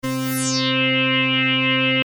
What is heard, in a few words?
Noise Lead vcf